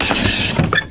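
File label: drawer close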